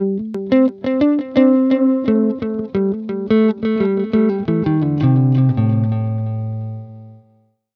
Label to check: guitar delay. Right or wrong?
Right